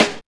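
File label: snare drum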